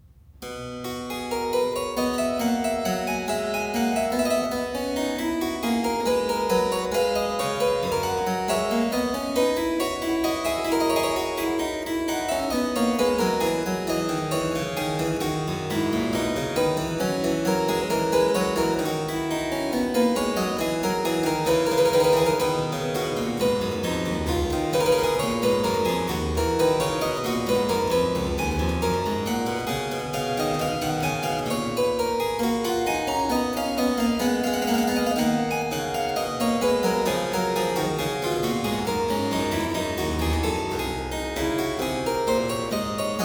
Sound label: Dreistimmige Inventionen in C major, Keyboard Works; BWV 787; J.S. Bach; Inventionen & Sinfonias